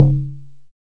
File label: dx100 Tom Toms G1; drum machines